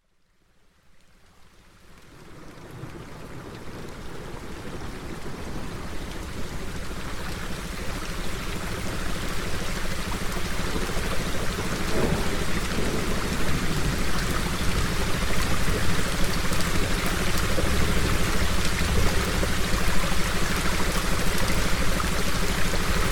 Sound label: RAIN Deep Theta Meditation